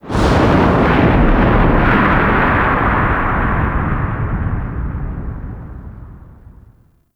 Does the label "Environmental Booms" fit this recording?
Yes